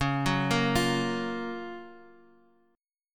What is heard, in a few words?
Gb Major 7th Db, guitar